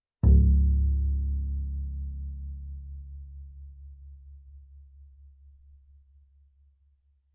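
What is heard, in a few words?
Bass pizzicato fortissimo sul C Db1, Double Bass; MIS Pitches - 2014; Strings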